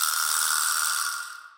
vibraslap

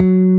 bass

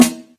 Drum; osu

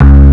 bass; osu

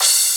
cymbal

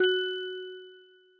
F#5 Gb5, Music Box; osu

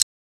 soft hit whistle

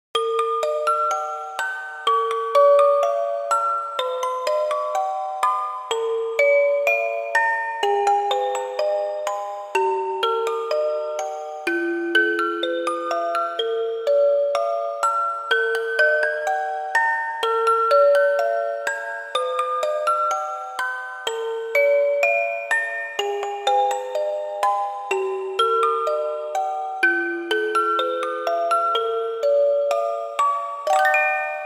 applause; osu

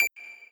lead d

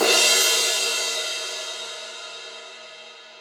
Crash Cymbals

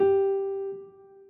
Piano Long G

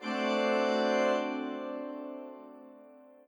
chord in a c d f p c d

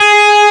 arp; osu

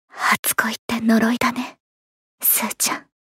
applause

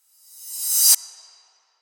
cym; osu

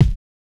Drum Kick

